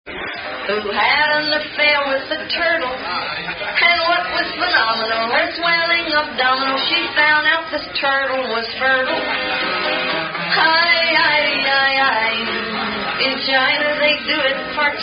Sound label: Limericks [Sung]; ring dang doo; jill lass